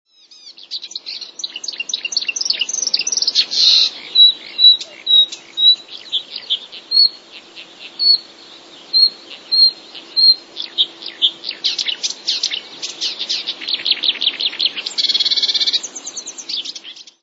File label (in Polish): lozowka, w śpiewie łozówki słychać dźwięki przypominające głos niepokoju modraszki; wroblowe; zapozyczenia